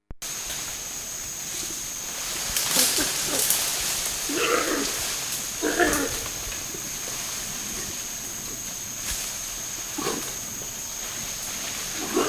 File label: Roar